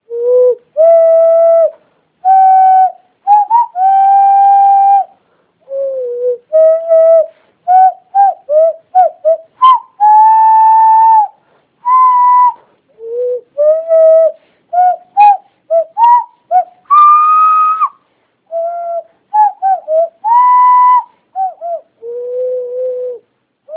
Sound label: theme hand whistle